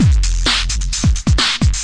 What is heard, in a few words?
130, drum loops and breaks; too dirty for words